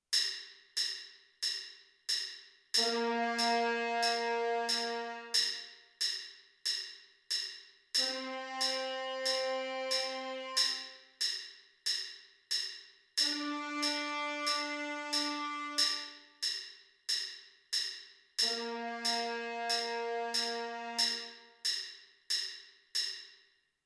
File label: trumpet